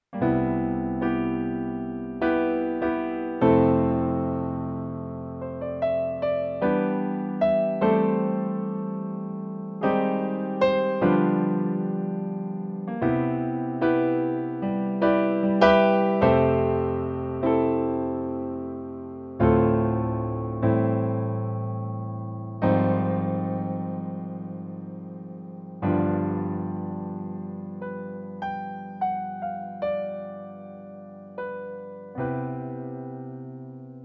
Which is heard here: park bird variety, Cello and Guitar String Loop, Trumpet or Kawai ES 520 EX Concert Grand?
Kawai ES 520 EX Concert Grand